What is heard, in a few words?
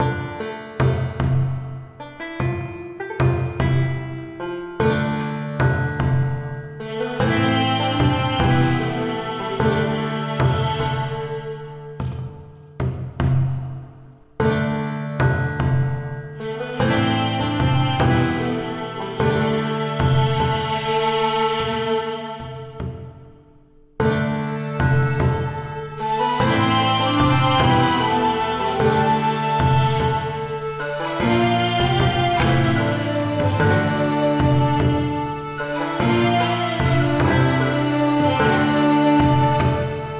Tavern LOOP LIVELY; Heavenworld